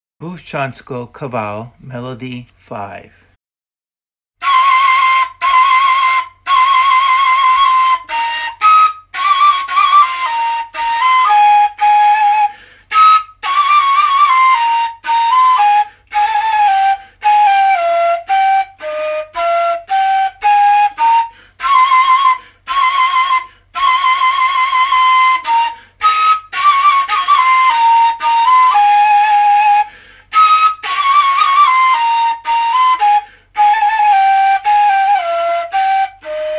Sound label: kaval; Bufcansko